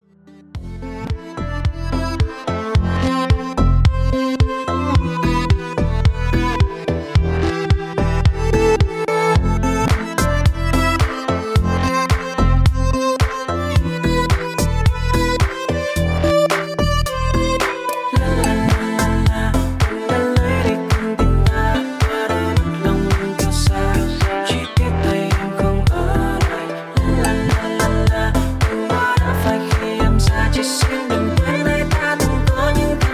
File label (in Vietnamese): remix khong loi